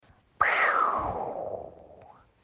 rocket sound

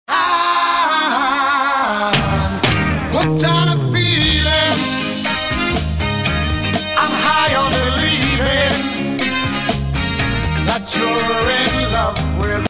aaaaaaaaa

